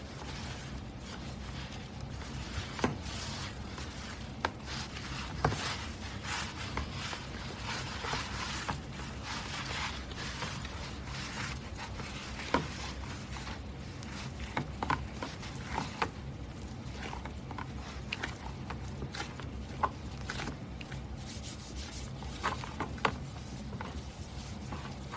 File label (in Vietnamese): tieng nhao banh nhao bot lam banh